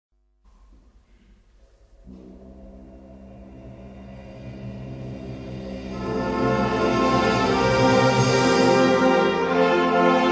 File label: Senior Band